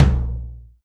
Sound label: tom lo, Alesis DM5